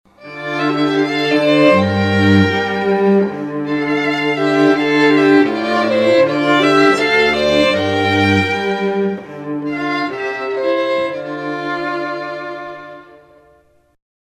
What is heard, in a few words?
strings; dodi li